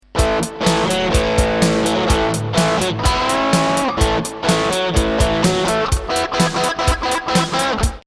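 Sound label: Strat Distortion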